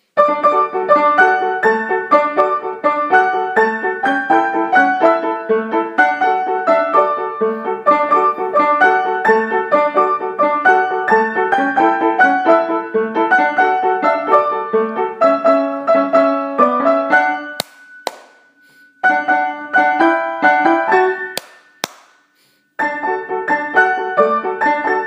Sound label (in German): Dino spielt Schlagzeug